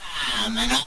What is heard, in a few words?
alert; svencoop; schall